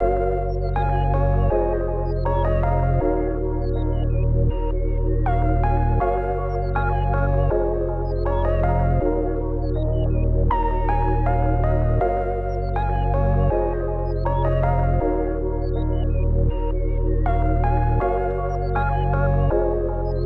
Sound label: 80 BPM A# Min, Melodies Lofi; Away Again; Cymatics; GHOST (Beta Pack)